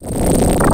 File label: digout